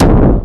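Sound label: hammer tron kick; TECHNO RAVE; Pro-Rec - Synthsonic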